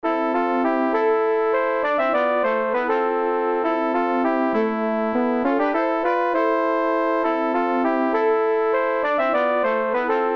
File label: Bourrée; de Verezen